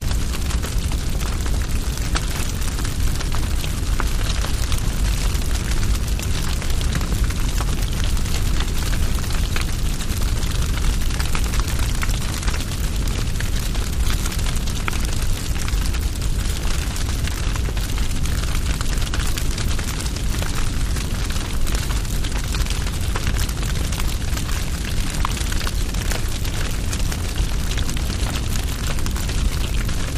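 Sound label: Fire Crackle Large; medium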